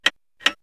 Tack Tack, perc fx; X-Static Goldmine

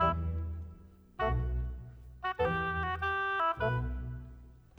Orchestra

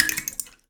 Finger Stinger; Battery 3 Sample Kits

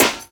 SD, Dance Mega Drums; Best Service

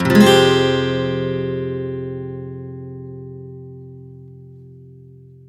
F min9 downstroke, Acoustic Guitar; Sonic Foundry (Sony Creative Software); Songwriter Acoustic Guitar Companion; One Shot Chords

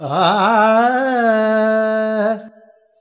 Voice, Vocals Male, World Pop; Sonic Foundry (Sony Creative Software)

